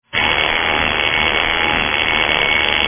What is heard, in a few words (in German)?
Wecker; wittnauer